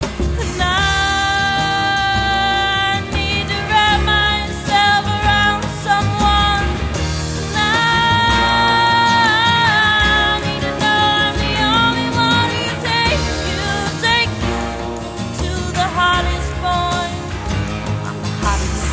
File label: acoust